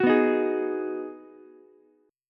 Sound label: Ab Minor 7th down Ukulele; strummed; JGuitar